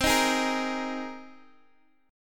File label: C Minor 7th Sharp 5th C Guitar Open D